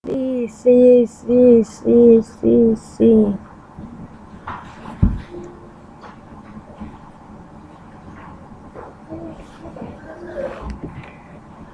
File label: sea